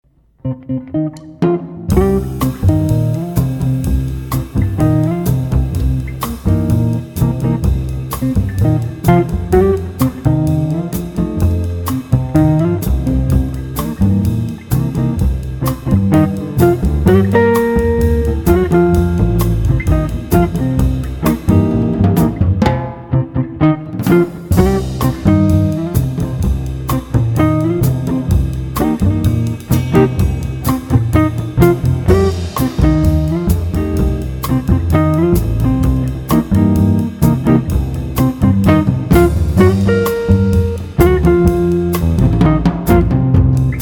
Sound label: Guitar, Bass, Drums